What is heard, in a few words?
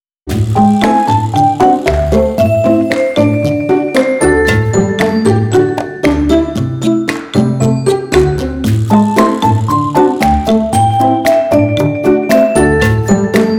sweetness pizz; by burak sound bank; cheerful vol 2